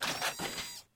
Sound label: rocket